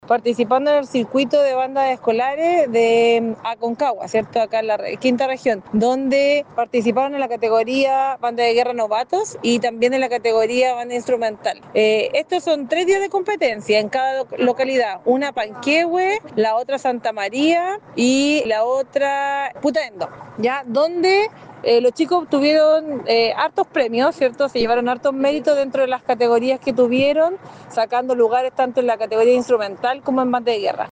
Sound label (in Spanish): BANDA BATALLON; MELIPILLA; liceo politecnico obtuvo destacada participacion en competencia